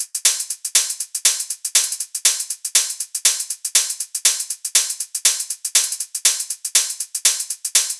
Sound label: drum loop step hihat 120